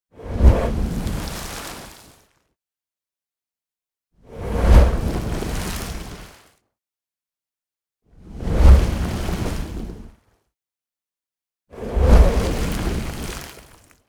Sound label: DESIGNED Geyser Errupt Breathy, Yellowstone Geysers; YNP; Game Audio Factory